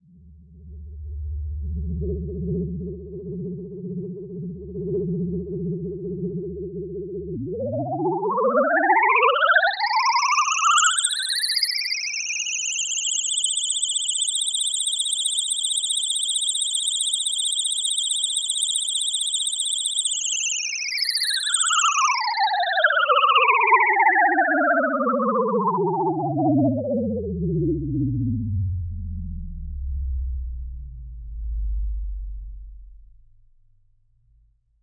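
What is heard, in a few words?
spaceship takeoff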